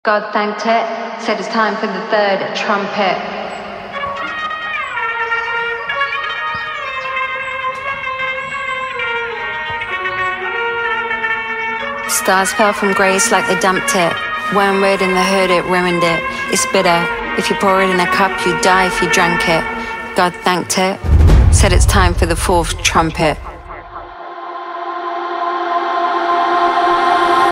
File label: TRUMPET